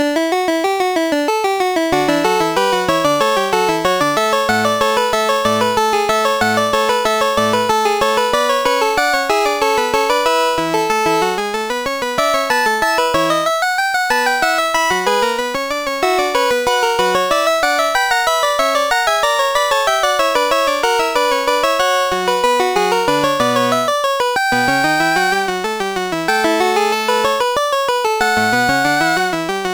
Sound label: invention